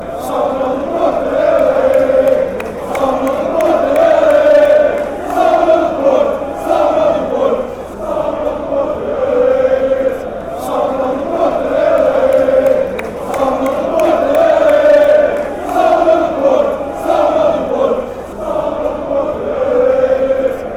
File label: chant, fanchants; beerschot